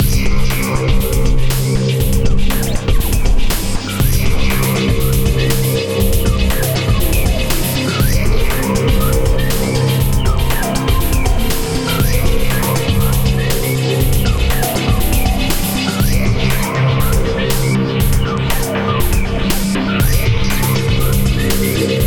Death Screen

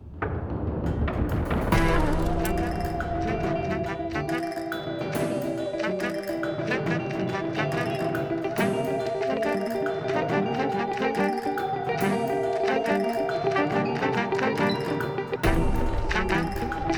Mystic Forest Action 140

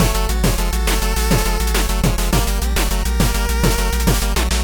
Boss Fight Intro Mono; Sigi A Fart for Melusina

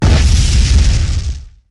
Battle Char Jump; MilkChoco

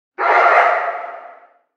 Dog Detect Tunnel Distant; Prison Architect

